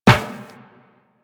Run Metal Perforated, Footsteps; Warframe; Tenno

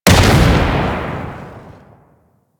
Object Radial Explosion; Warframe; Warframes; Harlequin

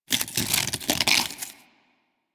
Armadillo Foley Scales Roll; Warframe; Hunting Critters

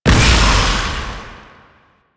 Incarnon Explosion Small; Weapons; Warframe; Duviri; Incarnon Adapters

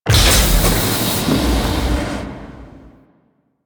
Blast Door Open; Ambience; Warframe; The New War; Quests; Zariman

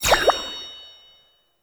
Vendor UI Close; Warframe; Nokko Colony; Vendors

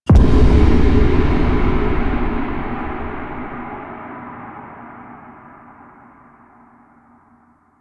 Character Stinger; Warframe; The New War

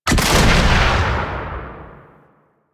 RPG Shot; Hellion Elite

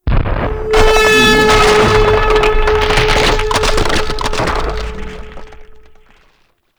Regrowth Orb Chatter; Props; Warframe; Ambience; Sentient Devourer

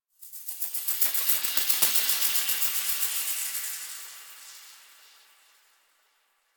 Exterior Space Rings Details; Ambience; Warframe; Railjack

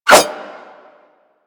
Dagger Whoosh; Warframe; Weapons; Dual Daggers

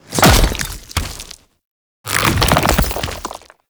Arm Entry; Warframe; Man In The Wall

